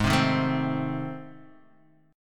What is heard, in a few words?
A Minor Major 7th Flat 5th